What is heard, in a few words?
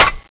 gun clip out; violingun